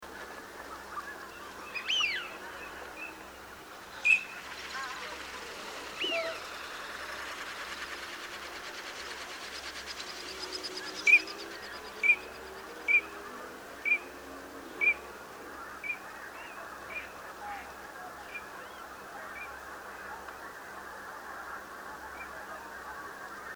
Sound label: eteal crecca WS M FC; caerlaverock